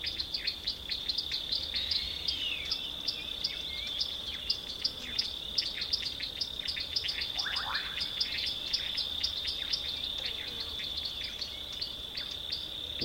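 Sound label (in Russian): сладкоголосое заливистое приятное звучание птиц и сверчков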